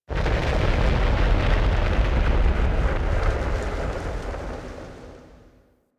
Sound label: Gas City Distant Cloud Clap Explosions, Ambience; Warframe; Corpus Gas City